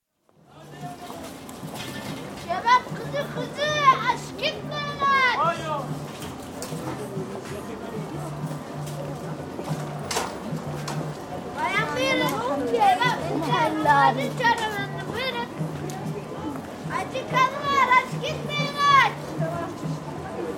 Istanbul, child streetseller, with voices, footsteps, and distant music; City; BBC